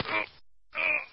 stop bleed; Source Engine; dod

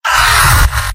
scout pain severe; mvm

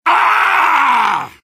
soldier taunt head pain; video games; source engine; tf; taunts

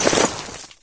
ice, player footsteps

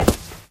black tile, footsteps

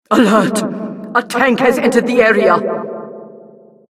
tank alerts; mvm; source engine; tf

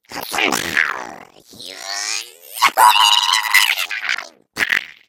eggbot trick fire

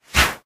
trade changed

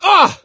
hurt major, gambler, survivor voice

